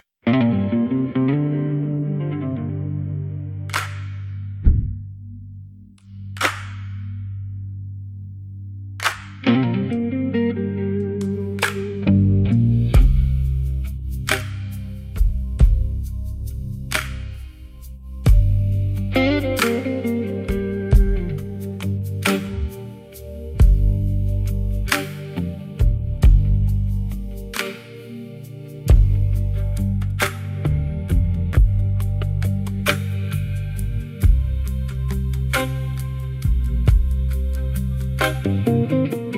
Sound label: instrumental